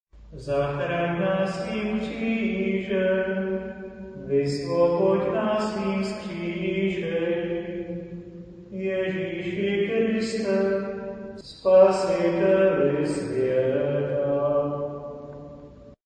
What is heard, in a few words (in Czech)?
tajemstvi viry choral